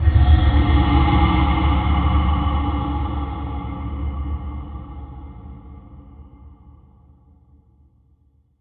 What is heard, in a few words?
Ghost